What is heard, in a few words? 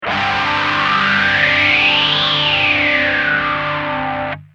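guitar, Yamaha phaser; ph100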